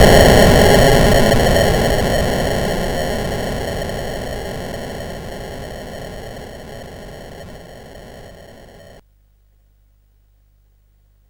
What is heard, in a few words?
Frantic, CSG8580R5